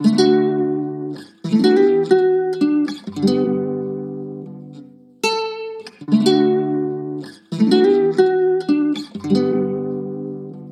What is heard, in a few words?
Guitar Loop, 79BPM, D